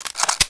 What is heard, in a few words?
awp deploy